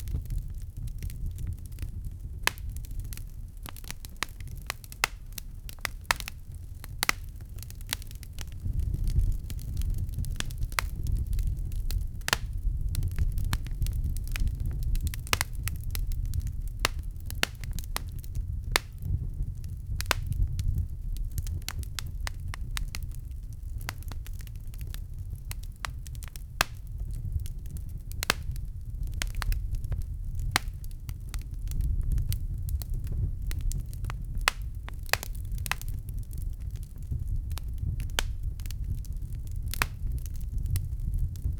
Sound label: crackling fire